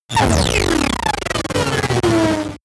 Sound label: break down